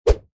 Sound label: shovel swing; blocks and bullets